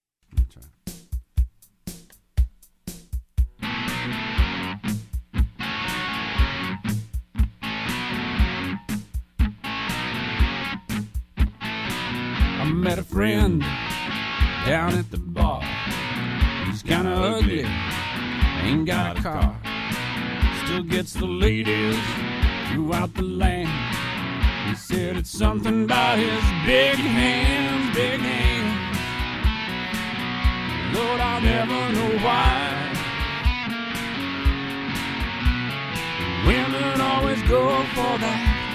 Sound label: Blues